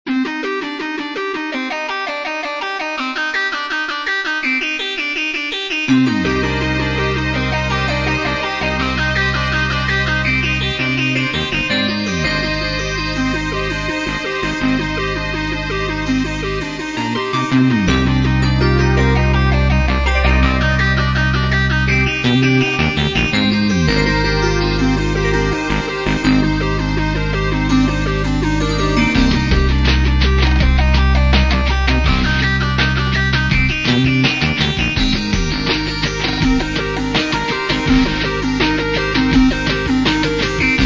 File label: drum